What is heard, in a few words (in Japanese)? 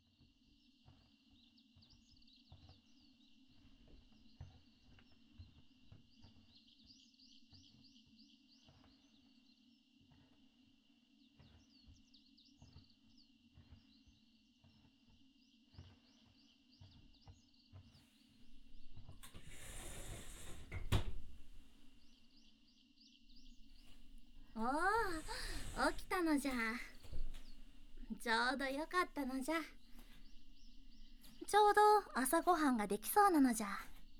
朝の炭酸マッサージ; 朝の炭酸マッサージなのじゃ